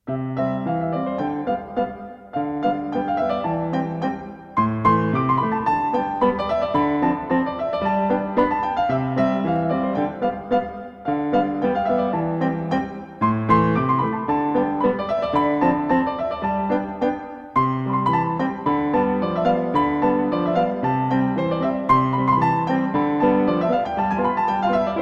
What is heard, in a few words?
Polka; Festival di Idioma – Aña 5